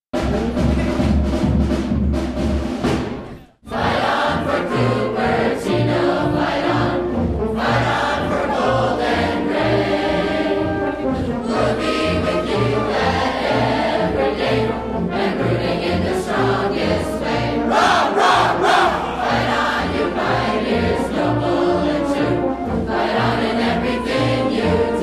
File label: Fight Song